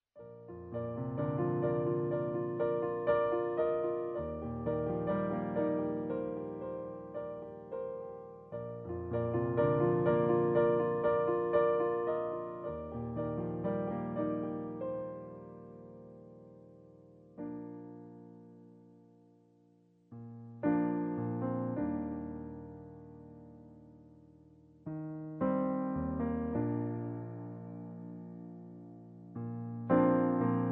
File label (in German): Playback, B-Dur